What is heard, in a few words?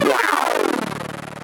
intro battle